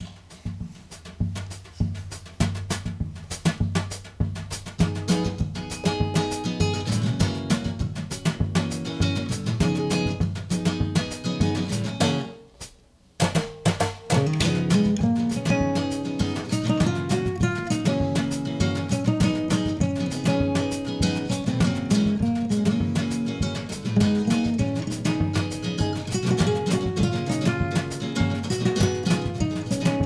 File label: Samba